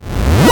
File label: Arcade Riffs